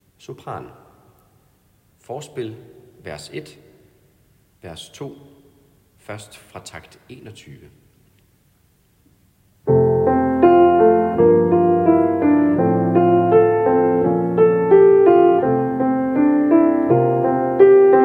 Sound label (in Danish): Gud du kender sopran; laudate kirkens folkekor